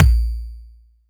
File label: BD